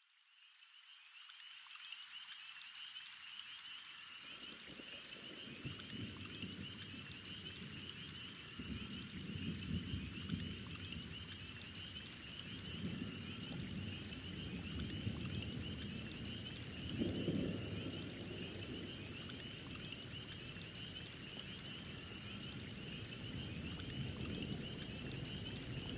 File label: Gentle Thunderstorm; nature sound